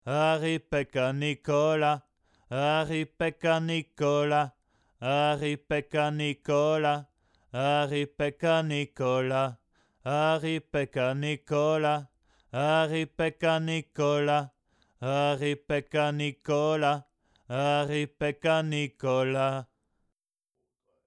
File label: voc